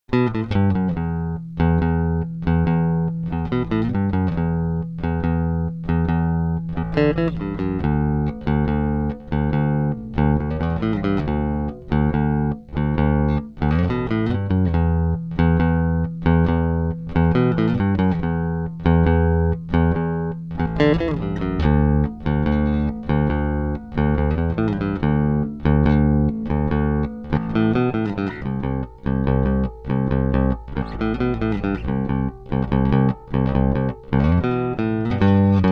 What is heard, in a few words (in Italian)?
plettro tono aperto